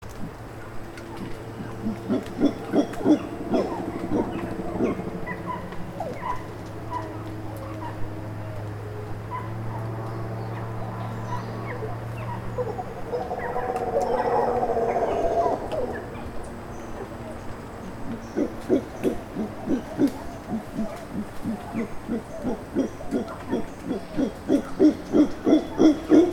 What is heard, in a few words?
mantled howler alouatta palliata